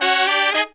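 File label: Pop & Funk Brass, 110 Ab, right; Best Service ProSamples vol.25; AKAI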